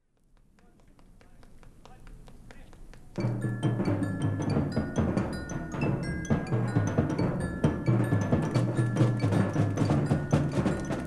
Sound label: Drum Solo